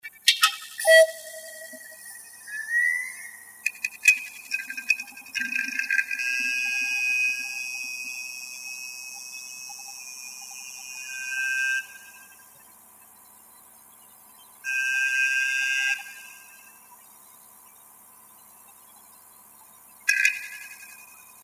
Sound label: startup, hdd seagate barracuda; dmax16